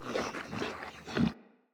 Corrupted Battle Dog Idle Breath Pant, Animals, Vocals